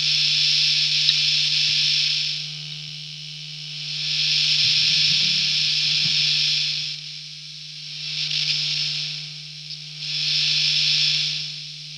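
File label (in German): Störgeräusche telecaster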